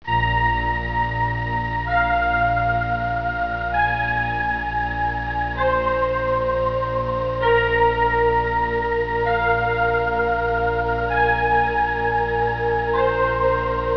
theme; trekdemo

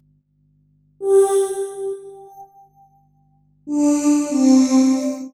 SYNT, 90 BPM

